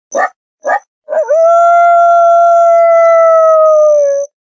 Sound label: Coy Wolf Bark Howls